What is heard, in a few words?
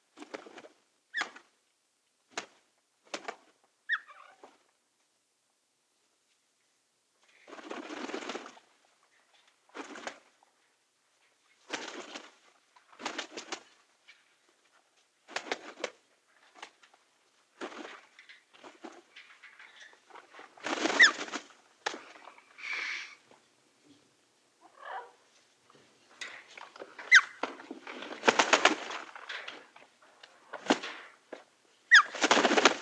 Birds Lightly Flying And Chirping